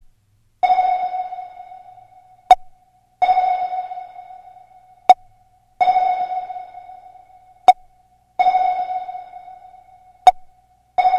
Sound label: Sonar, Klingelton; Soundeffekte